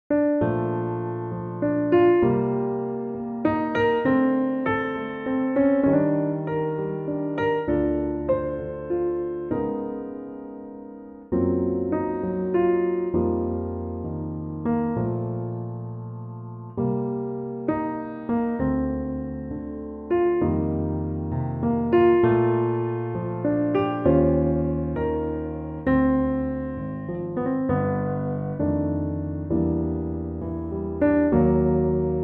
Bb piano; Pianotrak; key - Bb - vocal range - Bb to D; Intimate ballad piano only arrangement of the classic standard

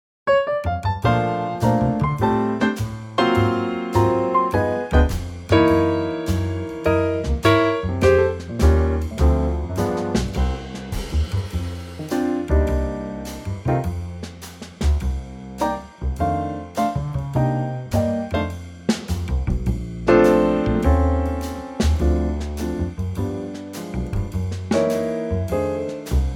Bb Trio

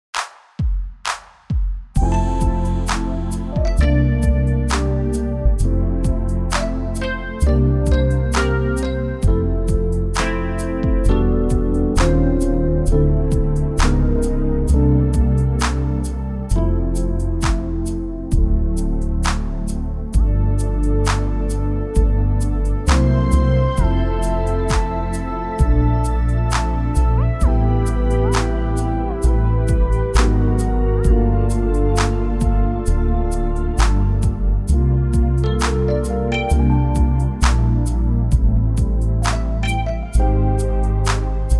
F smooth

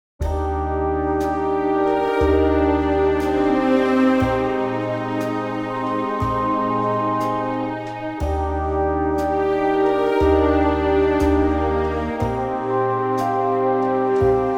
orchestral Eb

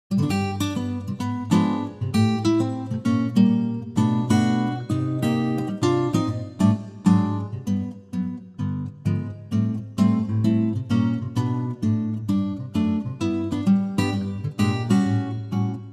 G ac gtr; key - G - vocal range - B to D

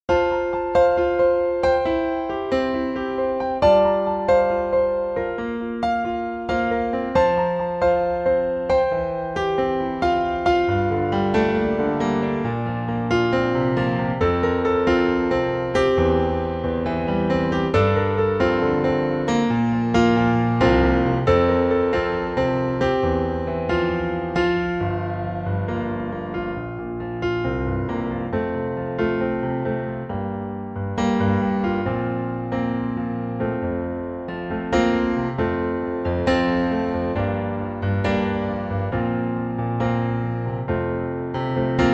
piano F

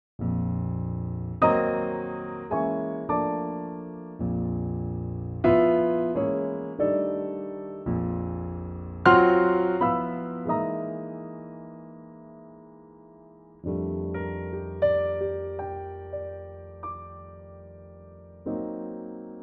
Bb piano

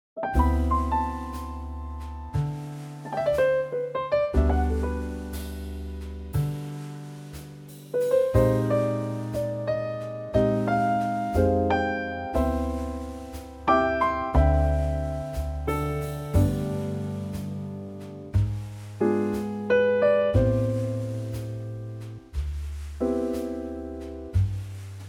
G trio; key - G - vocal range - G to B; Gorgeous Trio arrangement of this wonderful ballad

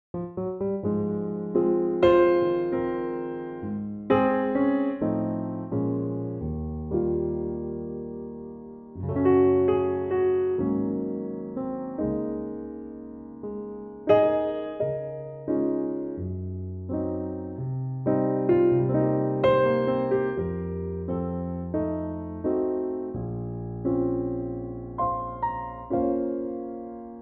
Db piano; Db version 2 Pianotrak

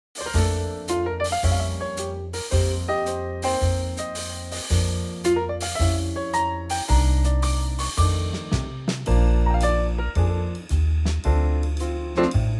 Trio Bb; key - Bb - vocal range - D to Eb; Gorgeous old standard in a really lovely Trio arrangement, slightly brighter in tempo

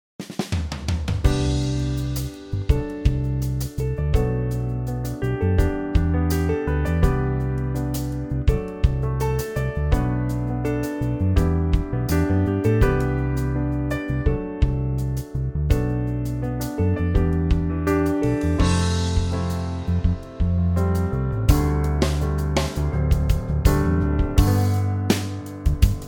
trio

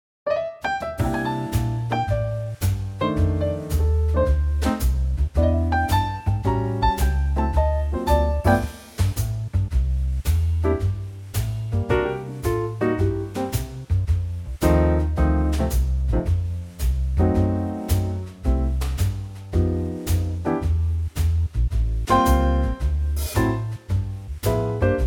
C trio

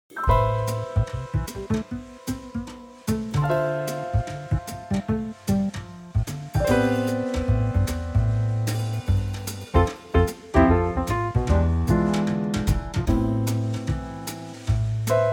Am trio; key - Am (C) - vocal range - E to A

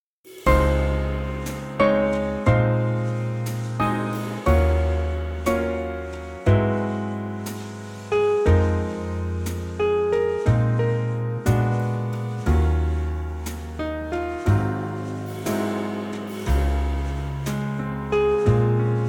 trio

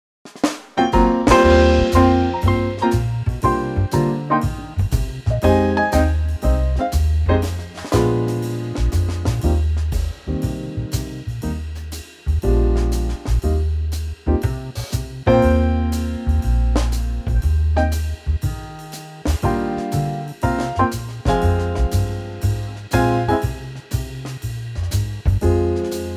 swing Eb F; key - Eb to F - vocal range - Bb to A (optional C top note)

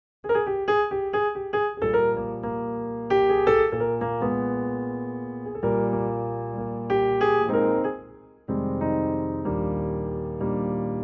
Eb piano

